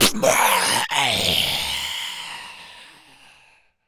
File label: Death, Zombies; zps; Carrier